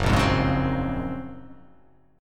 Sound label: F sharp Major Sharp 11th F sharp; 2,1,3,1,1,1,2,x; strummed